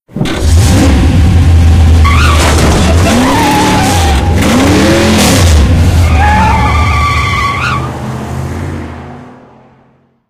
garage outro

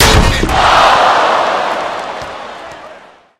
charge hit flesh range

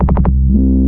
combat scan, scanner; Source Engine; video game; npc

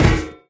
physics turret impact lg

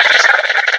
box monster chitter

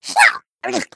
egg tease fail

